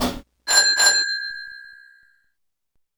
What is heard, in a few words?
Leave, train; nankai6000; OpenBVE